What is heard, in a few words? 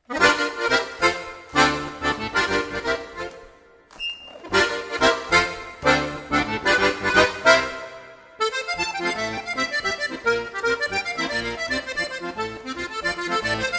Samba tucada